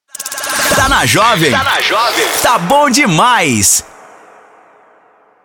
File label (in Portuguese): passagem; Jovem FM